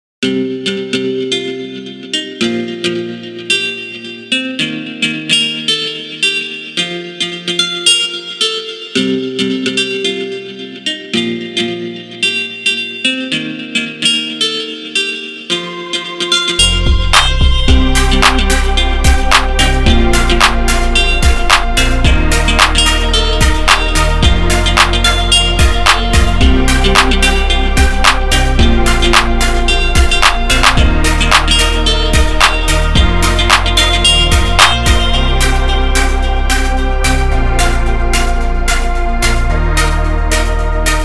central park; Vintage Collection VGM SFX tracks & Stuff VOL I

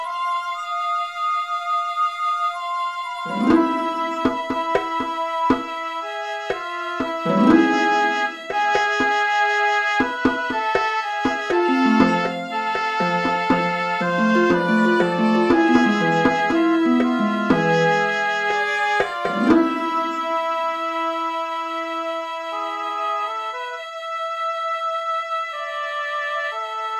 Negev Desert Loop

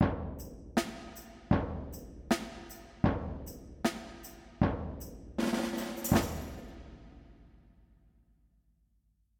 percussion

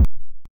door ball out

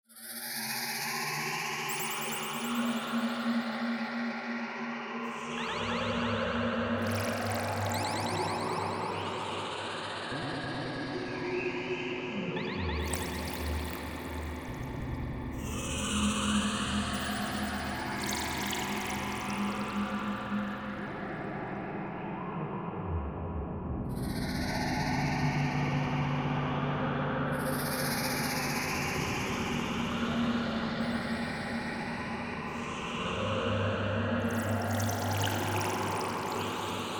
Damp Cavern

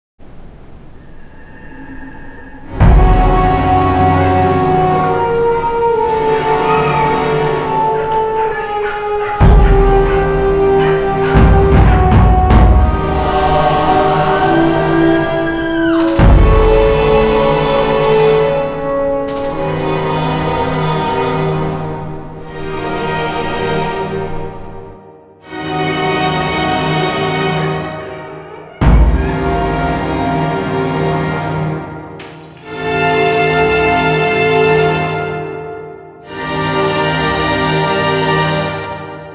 cinematic entrance; gang lands

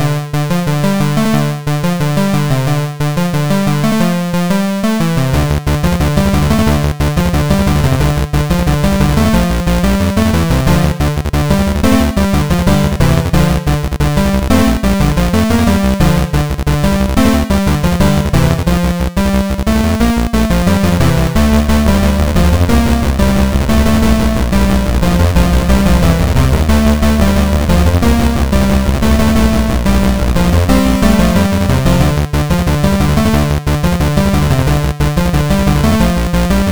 A fast paced Chiptune for race/ Boss battle.; as fast as you can

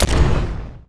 rumble

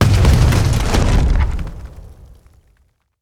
cannon hit ship short

